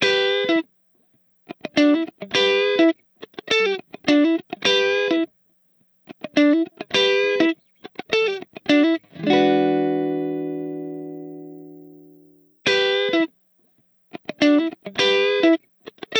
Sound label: Rhythm Guitar, ringtones